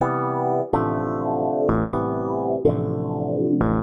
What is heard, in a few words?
SYNTH VOCAL 125 A; Keys